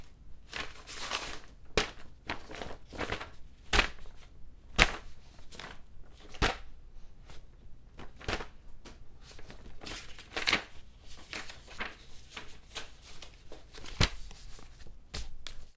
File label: INT Handling paper pages; EFX; Poetry Project